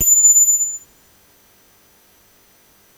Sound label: soft tines A7